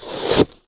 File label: blunt away; The Elder Scrolls III - Morrowind; Morrowind GOTY GOG